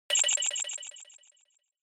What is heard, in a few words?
Short Accent High Delay